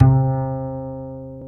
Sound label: Bass Acc; CMI